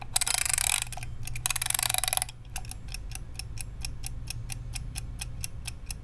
start

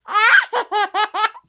Baby Belly Laugh Short

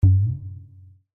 bongo

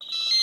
start

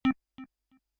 flip

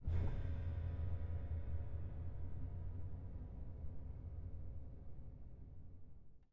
pedal D, piano pedals

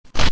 tear

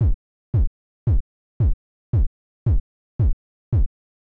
music

